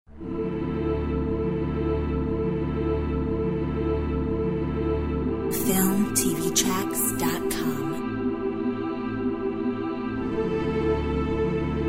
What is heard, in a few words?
Vox Humana